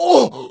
hit, pain; mohaa

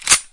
reload; pygame; pygun

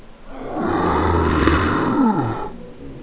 bullchicken attack growl; xash3d fwgs

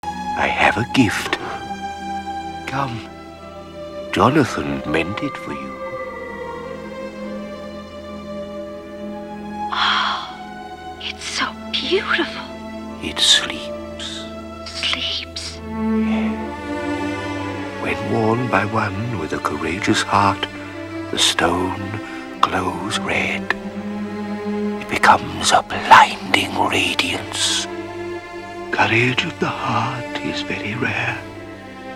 music; singe; Secret Of Nimh

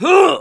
hit, pain; mohaa

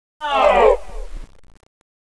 alert; xash3d fwgs; controller

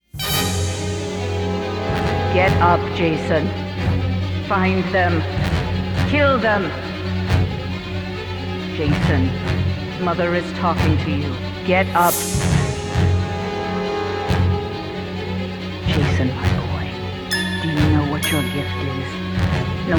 music; singe

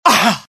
death, pain; dialogue; IT; mohaa

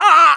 hit, pain; dialogue; mohaa

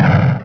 explosion; hurrican

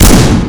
shotgun; cdogs

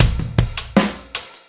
loop; cdogs; techdemo; soundtest

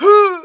hit, pain; dialogue; mohaa

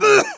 hit, pain; mohaa

